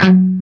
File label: CHUG F#2 L, Guitar; East West; Steve Stevens Guitar Samples Collection